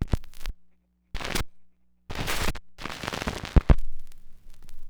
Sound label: Record Set Down; Sonic Foundry (Sony Creative Software); Hydroponic Hip-Hop